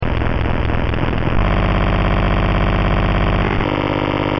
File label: Motor